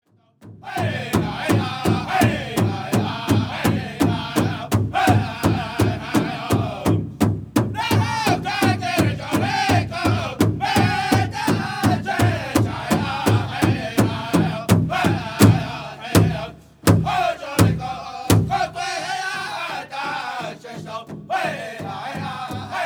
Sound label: Jingle Dress